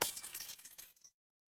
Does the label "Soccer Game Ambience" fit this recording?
No